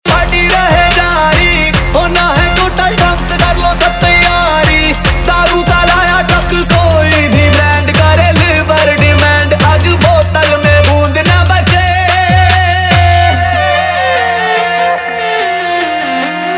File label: Trap Remix